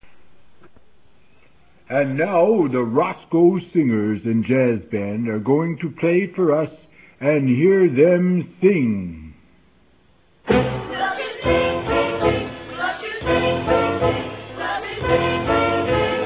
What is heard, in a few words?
jazz